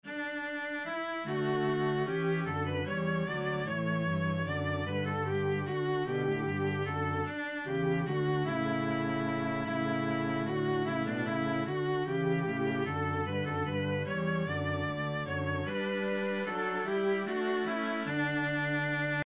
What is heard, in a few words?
minuet Full, viola